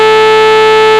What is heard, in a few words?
SAWTOOTH